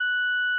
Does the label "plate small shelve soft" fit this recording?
No